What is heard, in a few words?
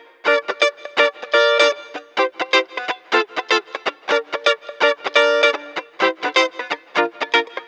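guitar, disco; Best Service ProSamples vol.48 - Disco Fever; AKAI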